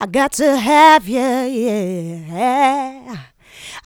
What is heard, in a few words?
Female Vocals; Houseworx